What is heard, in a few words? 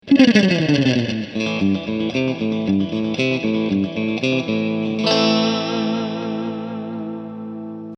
100 wet surf